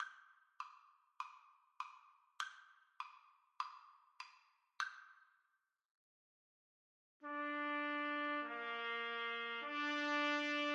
o sole mio TPTs

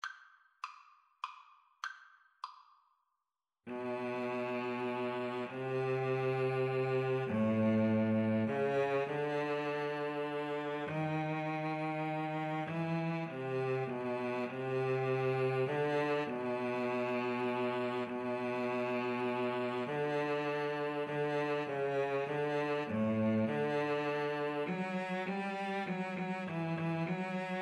we wish, Violin-Cello Duet

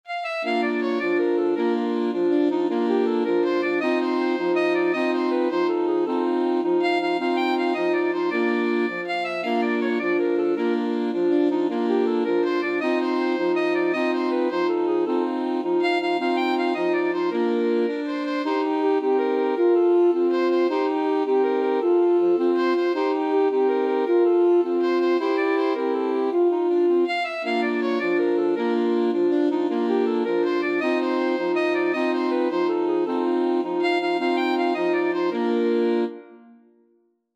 mexican hat dance, alto sax quartet

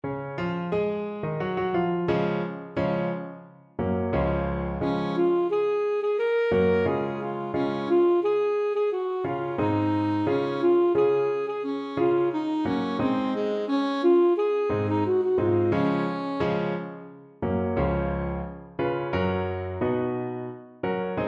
saxophone

